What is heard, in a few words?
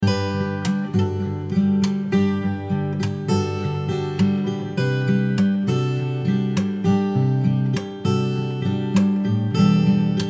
all these little things minus voiceover